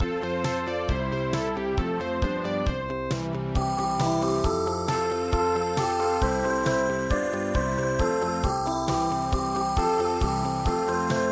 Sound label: Ballade